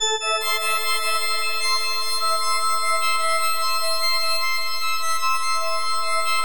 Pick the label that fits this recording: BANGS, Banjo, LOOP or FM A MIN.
FM A MIN